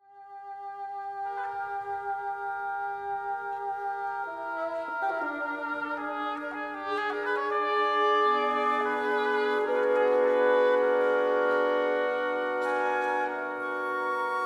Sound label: Classical Orchestral